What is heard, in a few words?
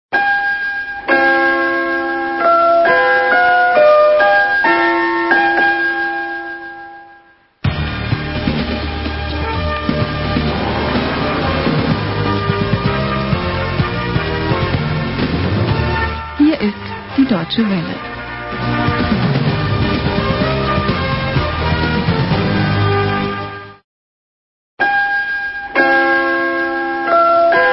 interval signal; dw 1999